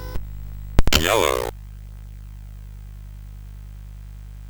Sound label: sid CSG8580R5; Cave of the Word Wizard Set 4